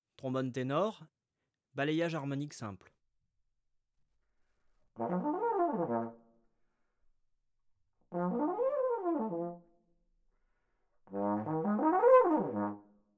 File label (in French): ténor balayage harmonique simple, trombone; Effets; traite orchestration